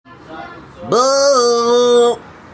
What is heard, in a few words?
bahhhhhhhhh